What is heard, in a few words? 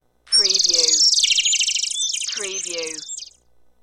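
Birds Chirp Short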